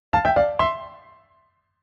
item get

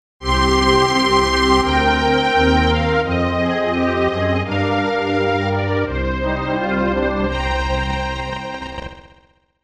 sfc game over

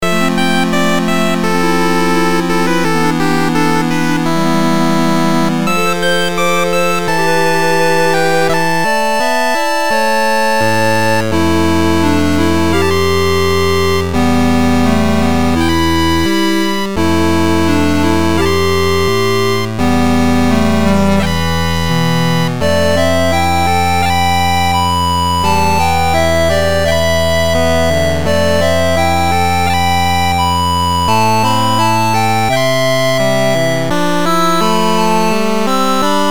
8bit mysterious reverb Loop

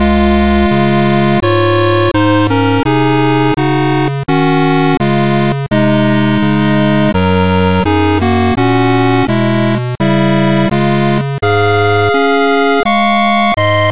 8bit

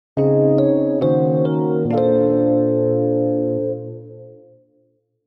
good night

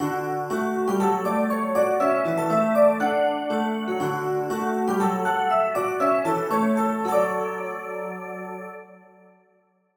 hassya melody

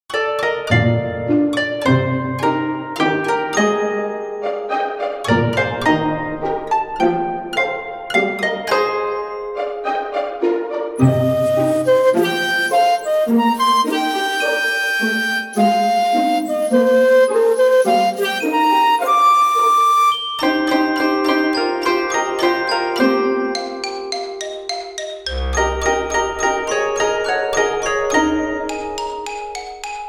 wanderer loop

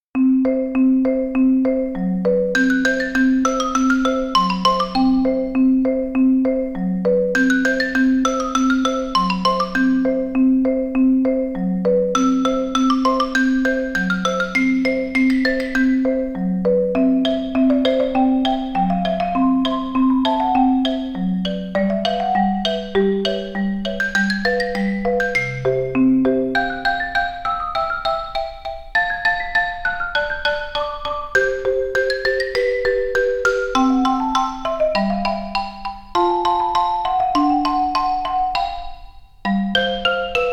xylophone, Cute/Xyloph

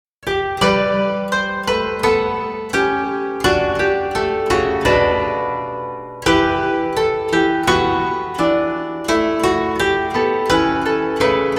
koto; kizoku shiro

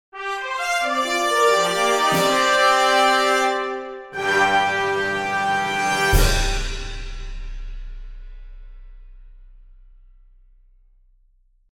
fanfare

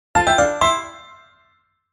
item get

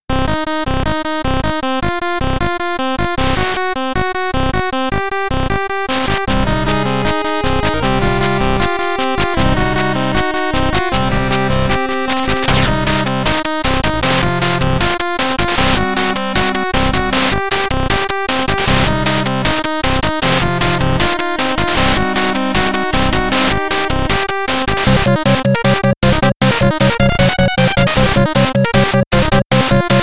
8bit shamisen samurai rock

